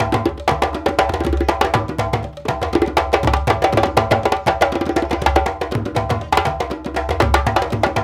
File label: Lamba, African Contemporary; Spectrasonics; Supreme Beats